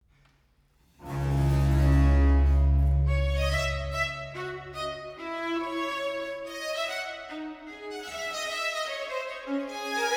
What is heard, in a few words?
Tambourins, Air Et Chour; Jean-Philippe Rameau; Le Turc Genereux